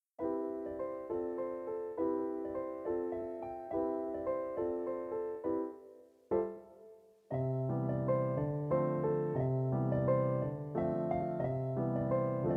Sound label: christmas bedroom; Tracks Toybox Edition